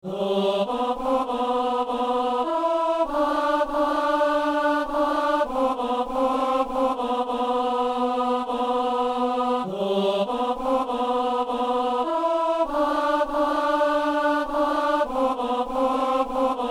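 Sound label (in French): ténor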